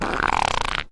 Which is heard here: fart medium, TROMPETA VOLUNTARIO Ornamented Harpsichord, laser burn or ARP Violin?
fart medium